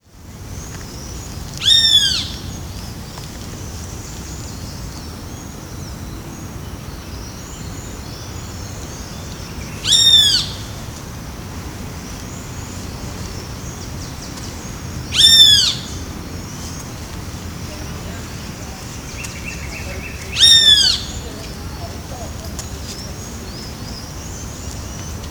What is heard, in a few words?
Pitangus sulphuratus calls; Ibirapuera; SP; SIlveira